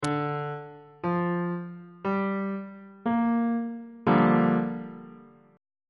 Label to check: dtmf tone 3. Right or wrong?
Wrong